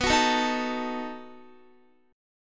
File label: B 7th B down Guitar; strummed